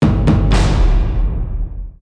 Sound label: lock; Ninja Avenger Dragon Blade